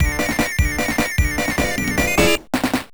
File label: Round Clear; Gynoug; Wings of Wor; Masaya; Genesis